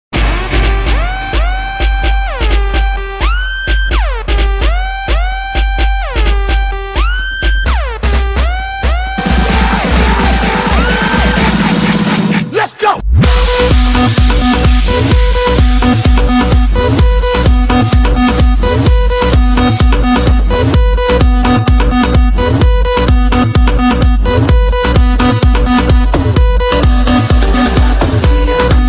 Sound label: House Remix